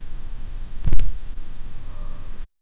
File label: a person doing a backflip; humans